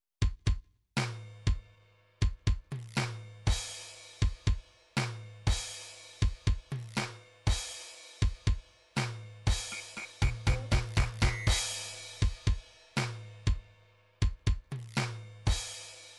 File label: drums, midi drum patterns